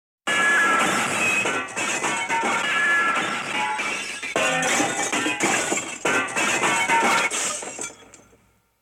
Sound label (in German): zerschmetterndes glas & metall; Katastrophen